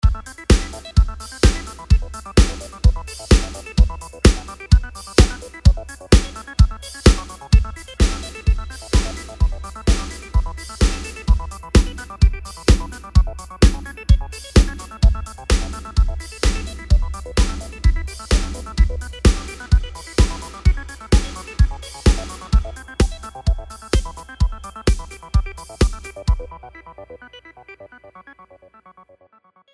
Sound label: Drumline; AtomicTransient